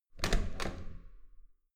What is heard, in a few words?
metal door heavy handle use hard